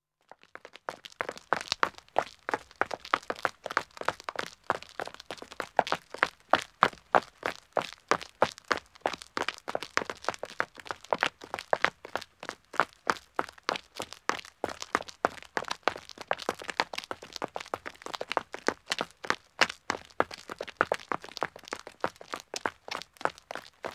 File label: Country Road Running 2 men st, Footsteps; The BBC Sound Effects Library